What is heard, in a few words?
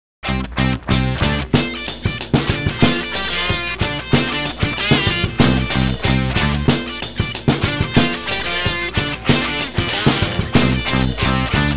novocal